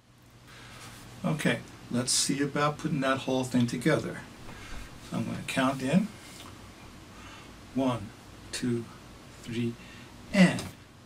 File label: Doumbek Djembe; Chitatelli